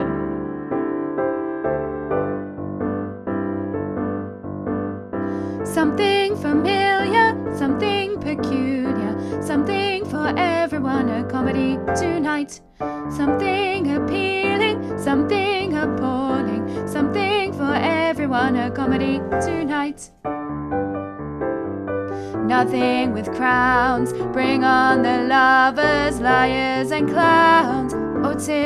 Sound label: alto